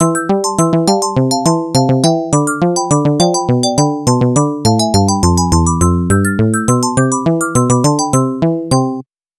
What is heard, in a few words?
arcade title; bomb jack